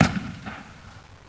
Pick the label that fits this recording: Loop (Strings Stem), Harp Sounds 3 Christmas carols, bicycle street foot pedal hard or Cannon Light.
Cannon Light